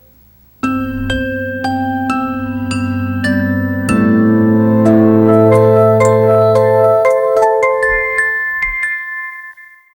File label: mood satisfaction comfort, chime